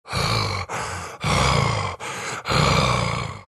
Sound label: breathing; bloodseeker